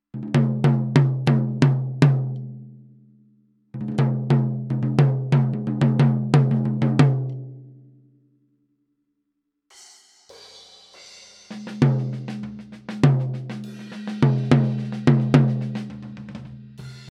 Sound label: tom